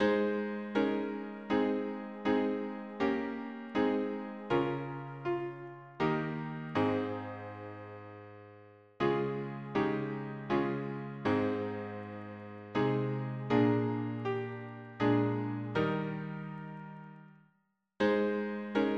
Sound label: harmony piano